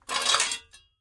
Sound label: Shovel Picking Up Me